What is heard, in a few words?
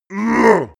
male giant attack; menschen; schreie